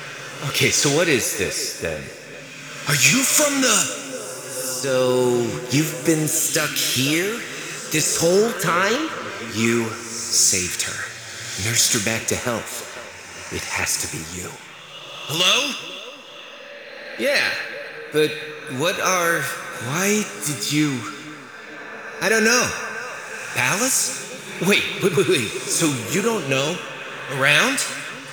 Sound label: Ghost Loop, Ambience; Warframe; Duviri; Bombastine Encounter